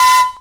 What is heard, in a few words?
Flute Failstate; Instruments; Zomboid; Lifestyle